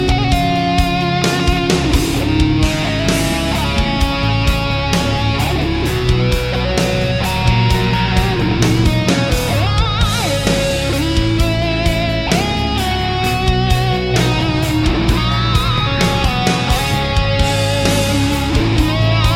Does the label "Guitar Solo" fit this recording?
Yes